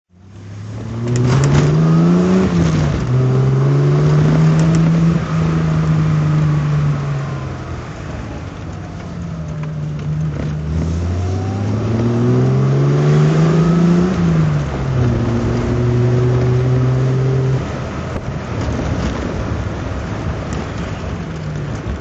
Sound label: triumph tr6 pi rally drive